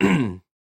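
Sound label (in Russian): звуки першение в горле кхм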